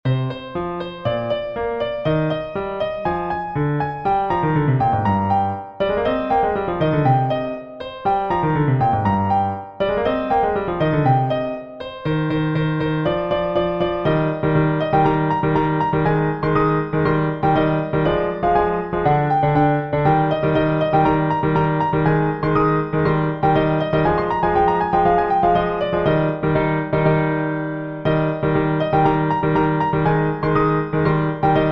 Piano

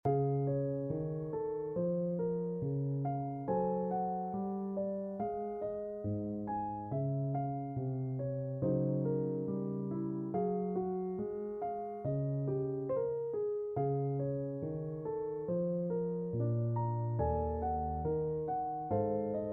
Prelude

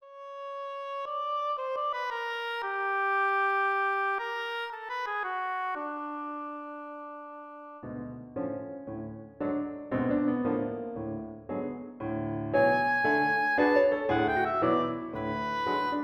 Oboe Sonata; I. In the Frog Marsh

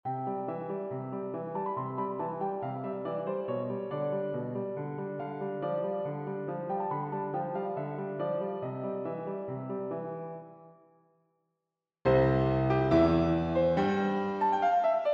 Sonatina

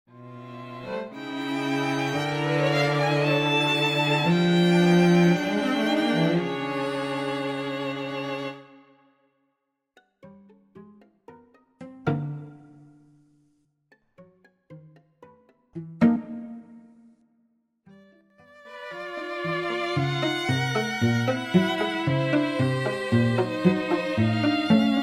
Vignette For String Quartet

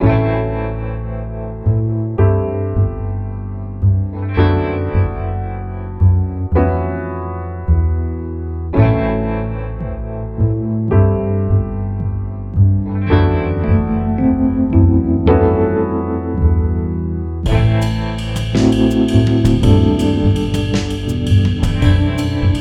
jazz

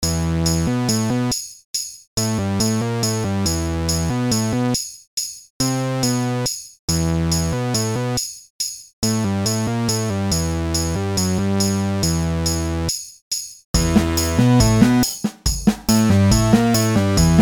VGM; unnamed vgm

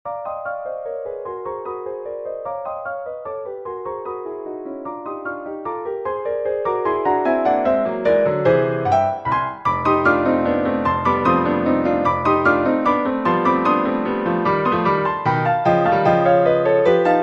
Strange Lullaby

